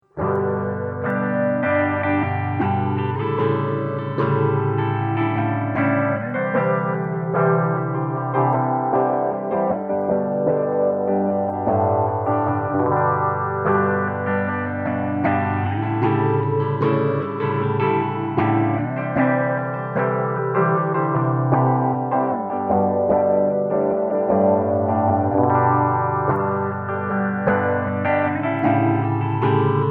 slow eight stage stereo guitar, phase shifter

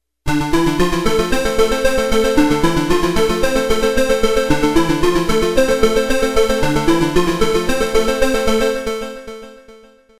intro solo, synth; analog synth; Penetrovisk

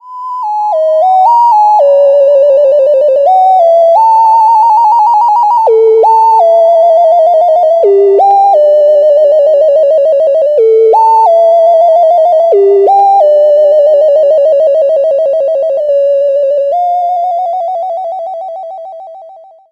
delay mod module, analogsynth

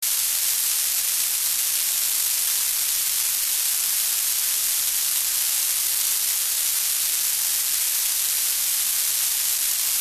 grainy noise hp mid setting; NOISECORN

